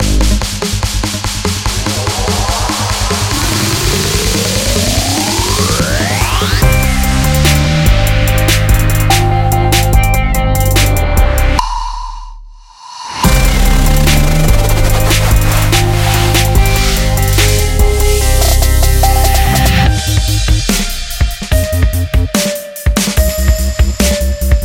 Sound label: transition effects; logic pro